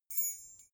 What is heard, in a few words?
key ring small fly tinkle